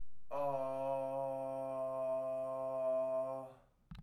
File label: C3 chest, Chest voice, singing